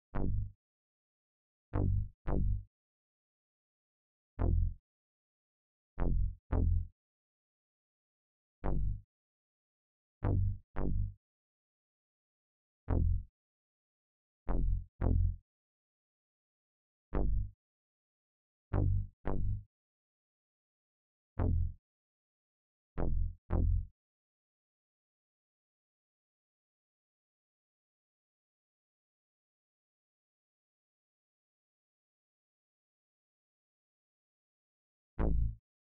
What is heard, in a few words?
bass